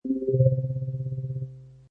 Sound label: Operational, Sci-fi, short, pleasant scan sound; Operational 1: Sci-fi, short, pleasant scan sound. Scanning information or open/close scifi door or panel.